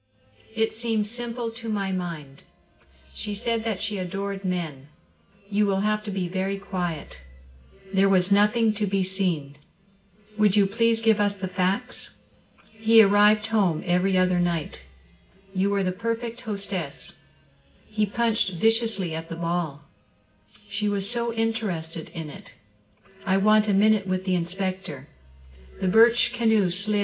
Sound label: office gitar out